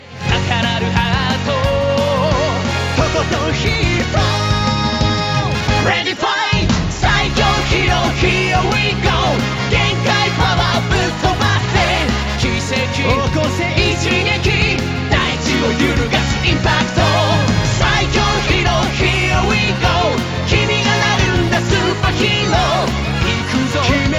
BGM loop

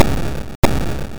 Error; Warlords; Atari: 80 Classic Games in One

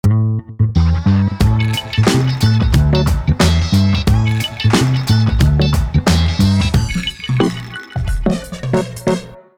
High Score; Megamind: Mega Team Unite; Wii

